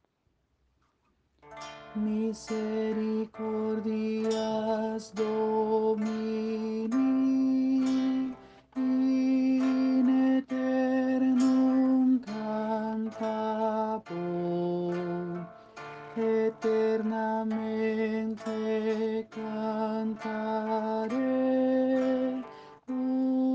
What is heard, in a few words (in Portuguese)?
tenor